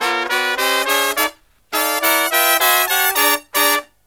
Brass Riffs

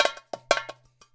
FILL, Percussion; InVision Interactive; GRUNT

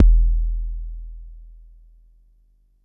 Bassdrum, Jomox Xbase09

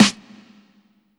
Hip Hop R n B; USB Soundscan vol.19; AKAI

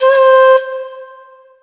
FLUTE L, Drum & Bass 160 BPM; Ueberschall; Drum & Bass Flash Trackz

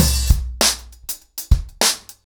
100BPM, ACOUSTIC DRUMS

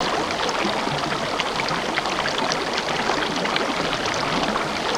SUBMERGED, WATERCOLORS; Spectrasonic Distorted Reality 1

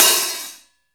MIX LHH, 14INCH OLD HI HAT

